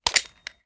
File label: reload empty xmag magout; gmod